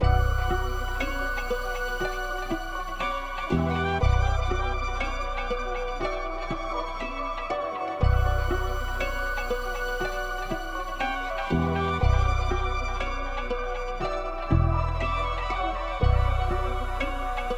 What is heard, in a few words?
120 BPM B Min, Melodies, Trap; Bazaar